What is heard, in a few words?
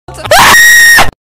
screaming